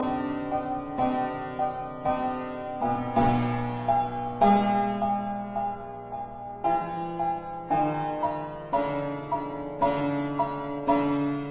Keyboard Sonata in C minor, Adagio Allegro con moto